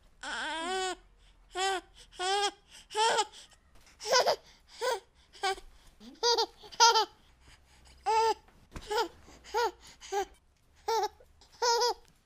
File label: baby laugh; human